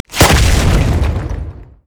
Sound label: spider attack; LoL